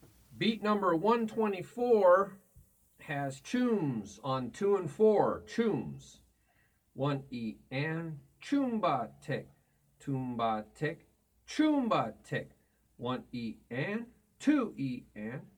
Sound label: Beat 1e 2e 3e 4e ToomBaTi ChoomBaTi ToomBaTi ChoomBaTi